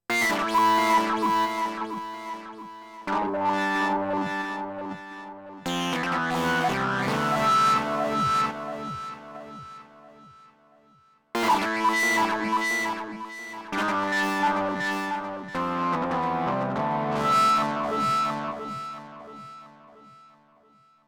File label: lead super hard